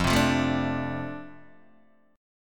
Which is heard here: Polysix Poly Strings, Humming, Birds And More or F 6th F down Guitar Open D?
F 6th F down Guitar Open D